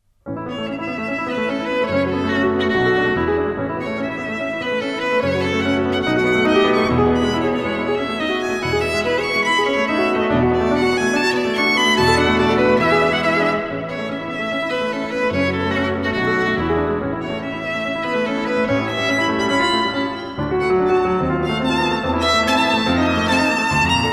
Suite per violino e pianoforte Finale; Alessandro Longo

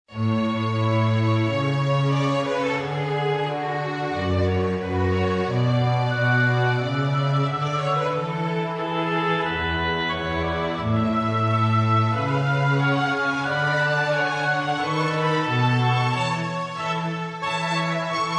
SONATA IN RE MAGGIORE

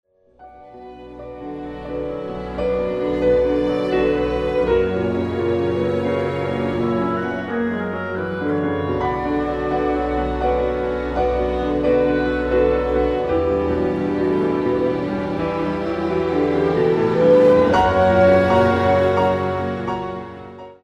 Andante moderato; Roma Tre

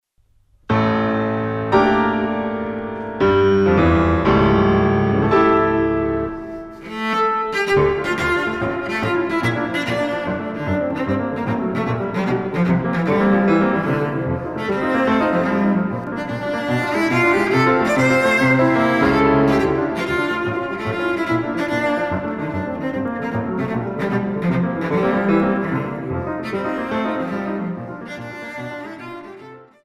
Suite per violoncello e pianoforte Finale; Alessandro Longo

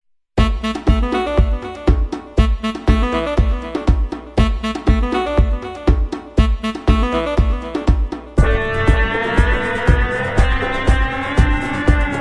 Ritmo Tribale; Suite Collection Vol.1

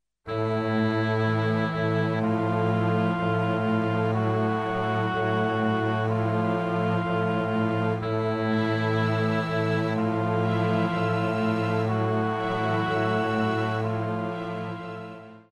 PIUTTOSTO LENTO MA NON TROPPO; Musica Sacra; Antologia di Musica Sacra Vol.2

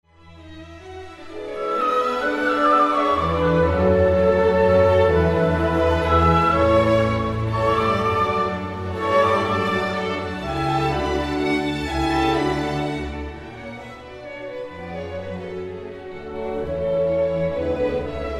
Con moto moderato; Roma Tre

